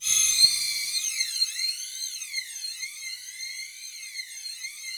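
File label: Rough Strings C6, Yamaha VP-1; 90 s Sample CDs; Music Store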